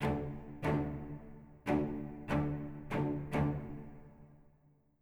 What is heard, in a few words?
celli; Netease; kill boss phoenix